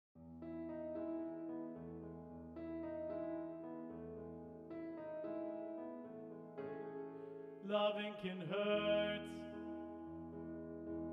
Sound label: Descant